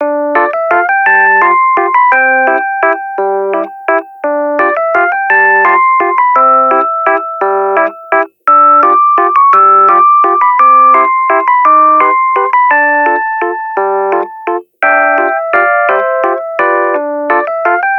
simple, ringtones; SK telesys SK-S100

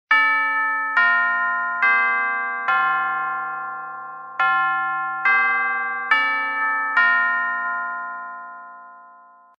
Westminster, ringtones; Huawei C8813D

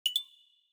allusion, notifications; OnePlus 7T

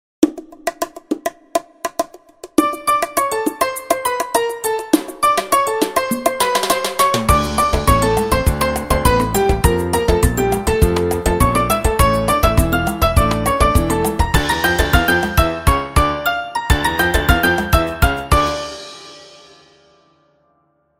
Latin, ringtones

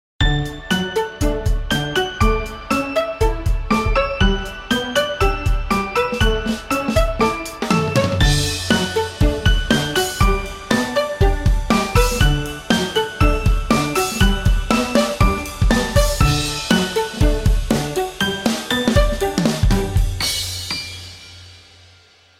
Relaxed, alarms; WAO

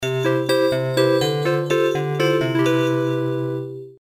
Dart Start, Game Sound; LG GD900 Crystal

